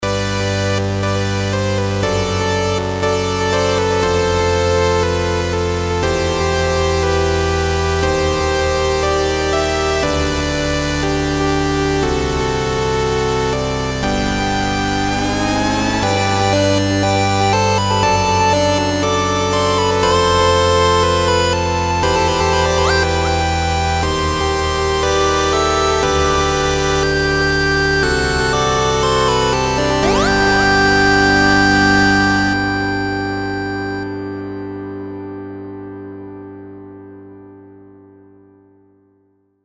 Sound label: Fanfare